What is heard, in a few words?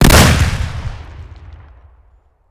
mr43 doublefire close; Escape from Tarkov